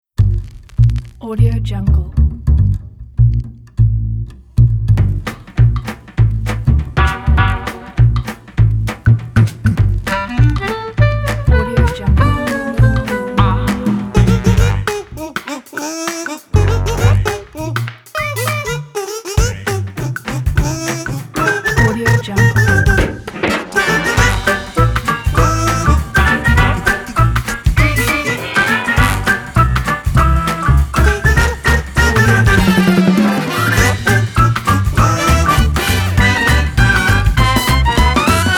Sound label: funny swing kazoo